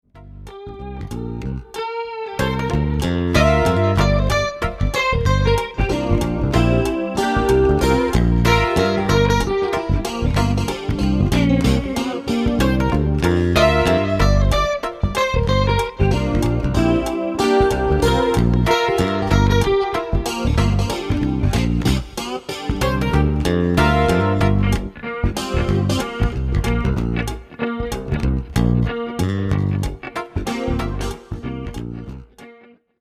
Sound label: Hip Hop Jazz Funk